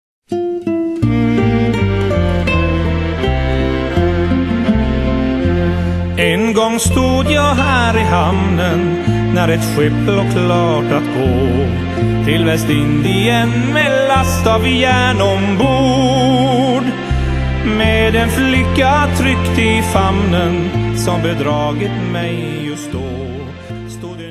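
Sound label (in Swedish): British ballad; Livets skaal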